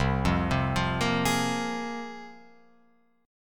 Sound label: C 7th Suspended 4th Sharp 5th C Guitar Open F; C7sus4#5 arpeggiated